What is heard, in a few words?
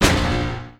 boost